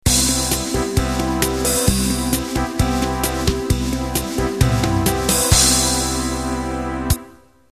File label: Pop Bossa End